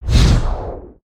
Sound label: Full Swing; Summoner Valiant